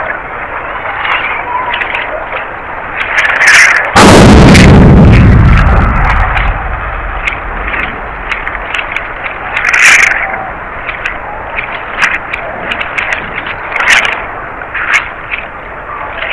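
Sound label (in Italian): sonificazioni eventi sismici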